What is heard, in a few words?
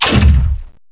fire laser Auto